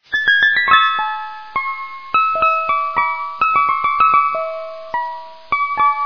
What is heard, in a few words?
minuet; Reuge